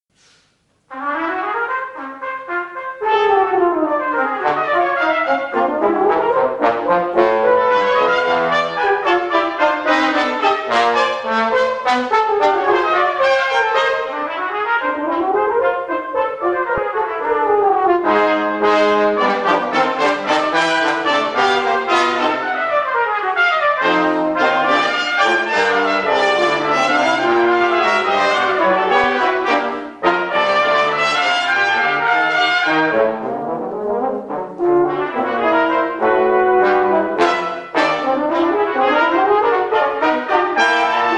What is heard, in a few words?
Grungers for Brass Scherzo